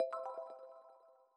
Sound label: Goal